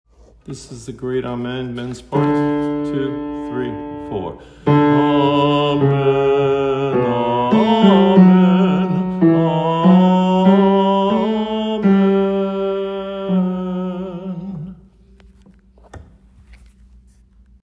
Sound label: Amen